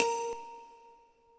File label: Synth